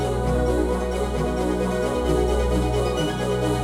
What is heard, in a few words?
the cave music lilypad; fujii ps4 windows gamerip 2019